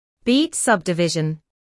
beat subdivision

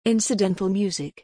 incidental music

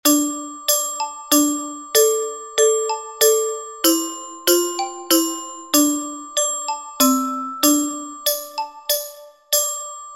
MARIMBA

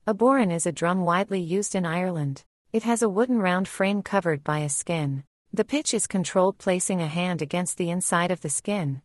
bodhran

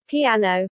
piano

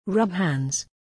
rub hands; body percussion

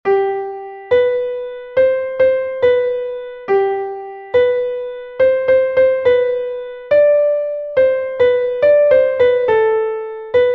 Rhythmic reading; metronome rhythmic pattern; triplets and duplets

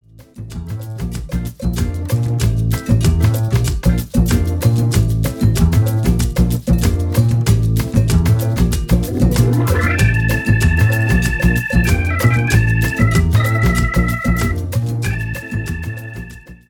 samba

